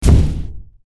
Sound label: Stomps; Forestboss